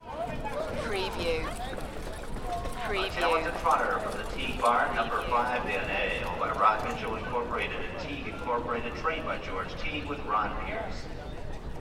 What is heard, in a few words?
Crowd, Horse Racing Stadium Ambience